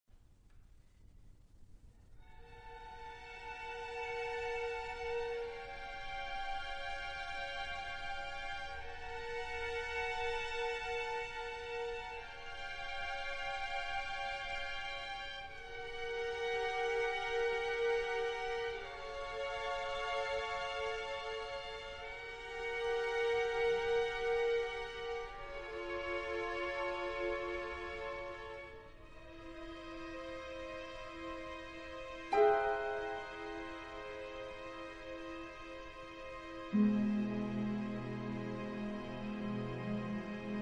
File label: concerto for violin and orchestra